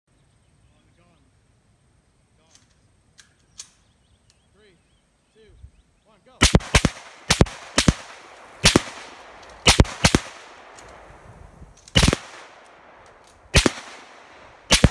What Bullets Sound Like When They Land Near You; Ｂｉｇ Ｍａｎ，Ｉｎｃ.

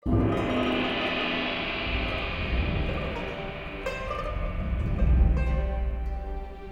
sitar

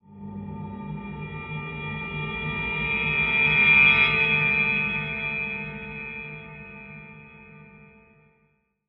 Stress Riser

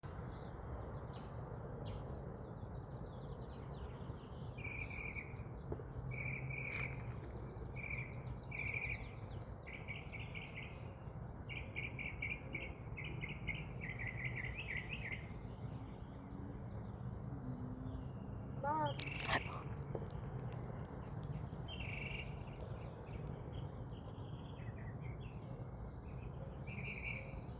Birds

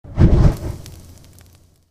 fire noise loud longer

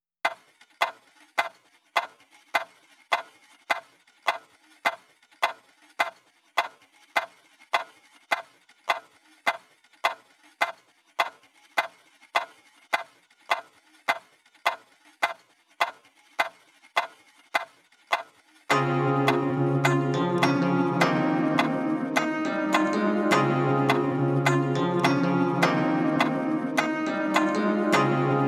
rain scope down; Leggysalad

